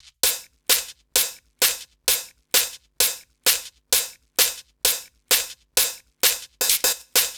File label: Hh Sh Loop 120; Equipped Music; Loungin House; Hh-Shake Loops 130